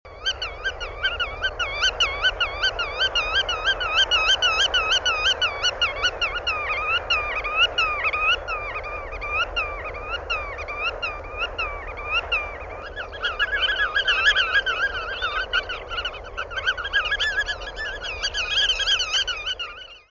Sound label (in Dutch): Grutto